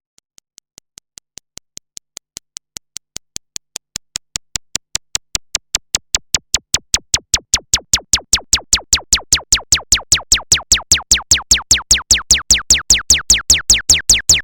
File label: Solo